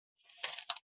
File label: sound of paper shuffling; winchester; century ghost